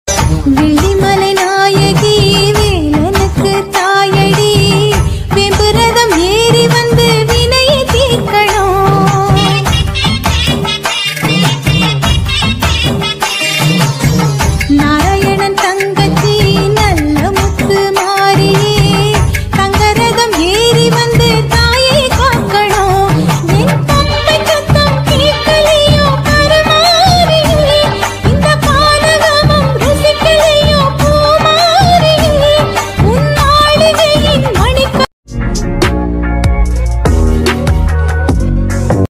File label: tamil devotional songs ringtones; amman devotional songs